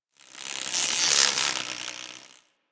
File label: Forest Close Insect Buzz Fly By; Ambience; Warframe; Grineer Forest